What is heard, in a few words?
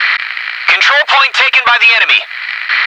noice